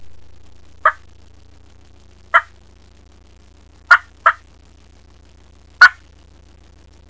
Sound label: cluck, Turkey Sounds